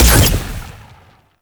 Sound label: Fire Shot